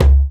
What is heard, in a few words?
African Perc